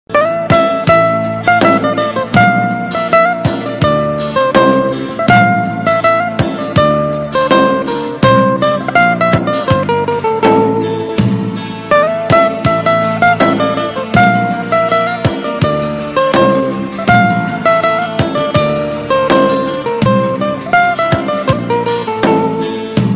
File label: turkish guitar ringtone; nokia ringtones